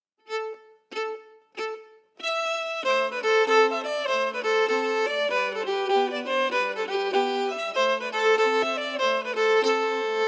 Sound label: Brian Boru melody